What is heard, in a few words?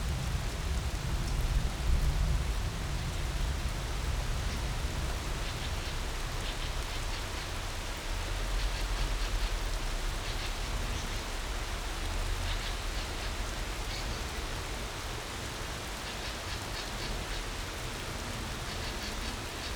Rain, Distant Thunder and Bird Loop; Double Trouble Audio